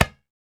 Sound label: PRC TABLA